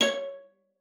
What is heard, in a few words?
C#5, VIOLA FINGER; Xsample VOL-5